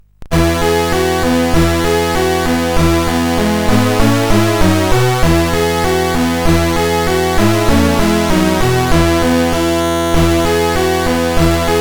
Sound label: Chimes, CSG8580R5